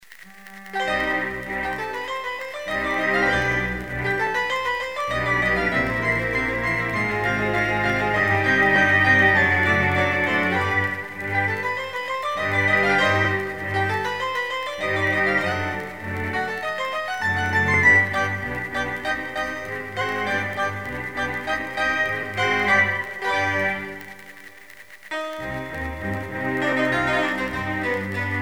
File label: Wood Striking; Castlevania Symphony of the Night